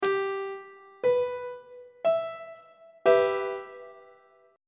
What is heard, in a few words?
f flat minor 1st inversion on treble clef